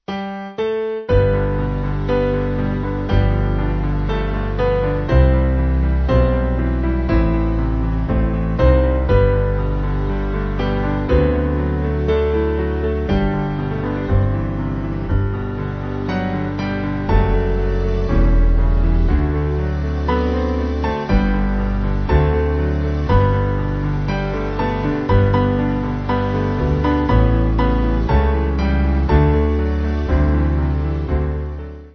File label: Band